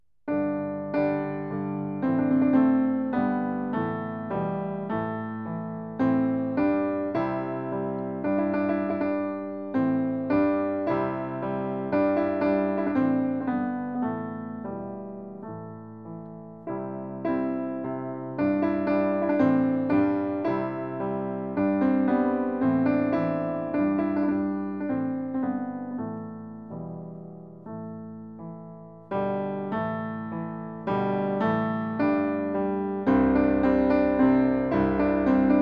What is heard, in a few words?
Kurdish Melody; Piano; Fourth Way